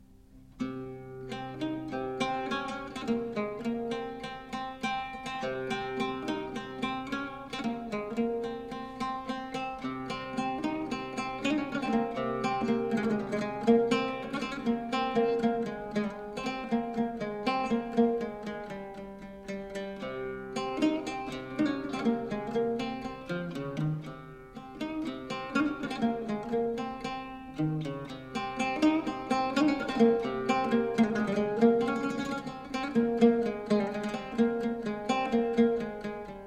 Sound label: Taqsîm